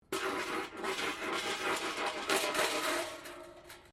Metal rubbish bin lid spin concrete

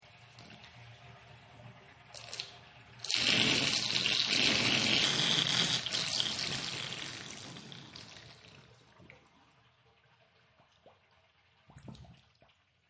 Bathtub Drain; Bathroom; Household

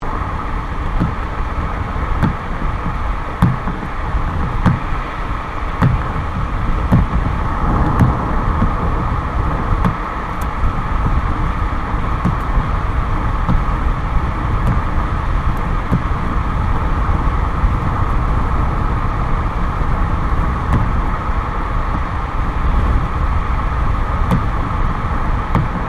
Car tyre noise fast catseyes; Cars (Sound Effects:Vehicles)

